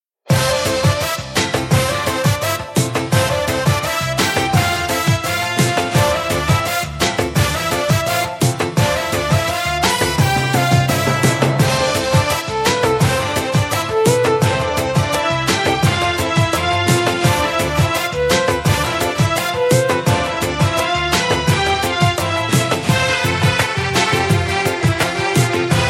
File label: anthem arabic